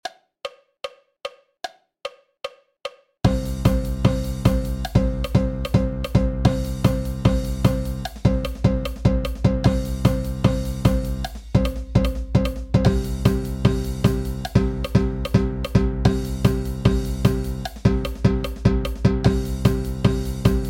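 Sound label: 150 BPM with countoff, Marching Percussion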